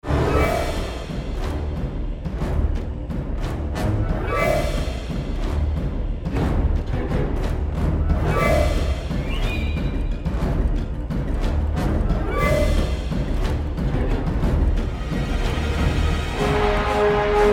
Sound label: attack fight